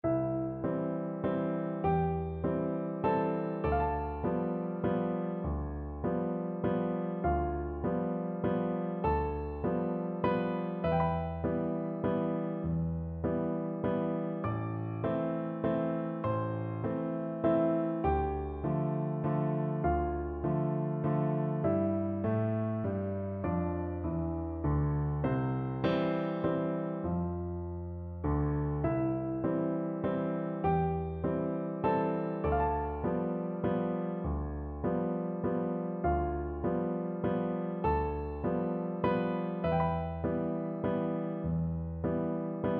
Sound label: skaters waltz 2 piano; waldteufel